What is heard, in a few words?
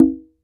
H CONGA OP, CONGA